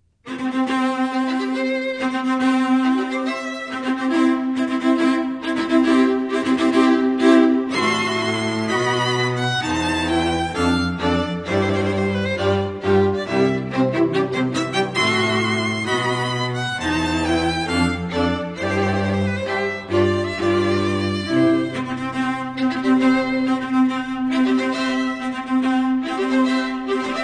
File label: Quartet Wedding March